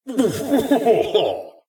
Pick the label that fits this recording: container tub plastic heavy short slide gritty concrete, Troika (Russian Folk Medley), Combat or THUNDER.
Combat